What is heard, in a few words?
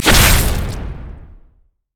savagery five stack; rengar